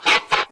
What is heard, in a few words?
Raptor Attack Vocals